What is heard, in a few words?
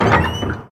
Opening Chest